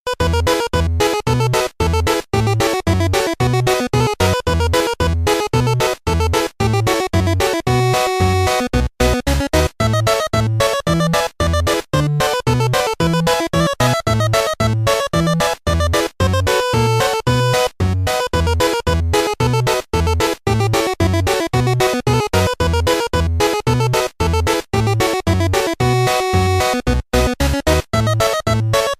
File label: 8 bits